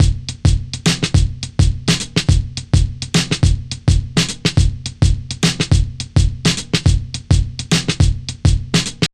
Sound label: 105 Breaks; SS Rolla